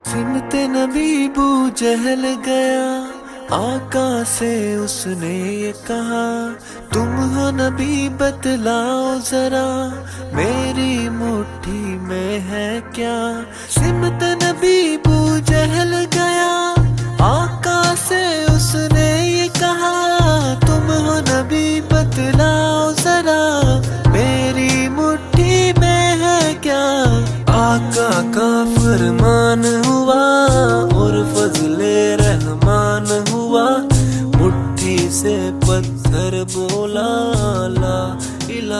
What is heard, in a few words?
Islamic ringtone